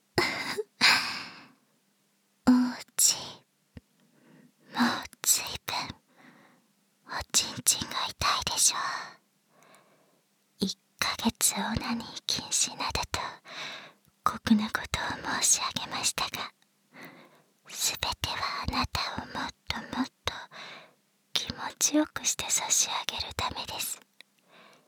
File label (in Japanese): フェラ責め